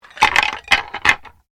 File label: Bones Human Move; Material components for constructing more complex sounds; Tapping (Sound Effects:Objects)